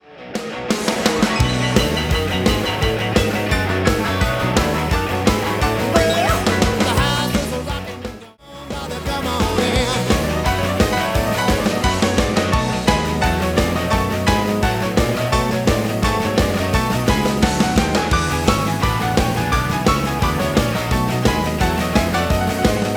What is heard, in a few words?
Piano Intro & Solo